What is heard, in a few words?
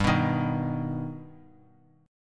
G Augmented G, chord; G+ strummed; x,10,9,8; JGuitar